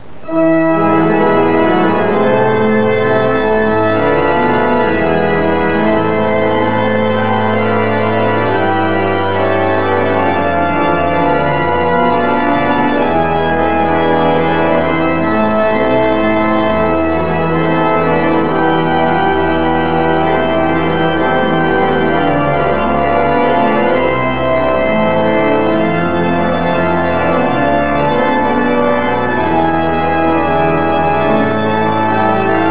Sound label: organ